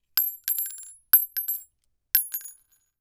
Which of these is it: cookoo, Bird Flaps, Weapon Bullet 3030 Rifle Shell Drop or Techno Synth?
Weapon Bullet 3030 Rifle Shell Drop